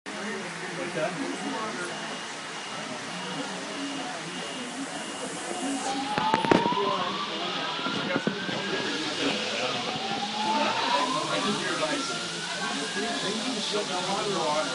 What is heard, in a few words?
Building Water Leak; Novell Provo Campus Building H